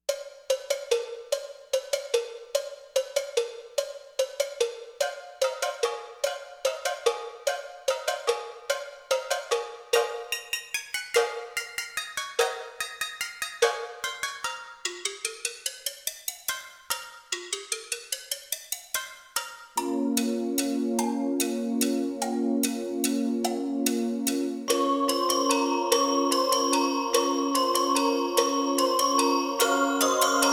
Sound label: Cowbells; Cow Christmas